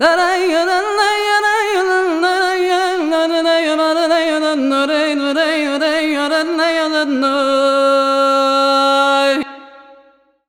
THA VOICE R; USB Soundscan vol.63; AKAI

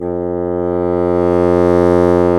Bassoons; Roland LCDP04 Orchestral Winds; 90 s Sample CDs

